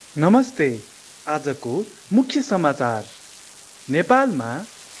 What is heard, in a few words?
radio; electricity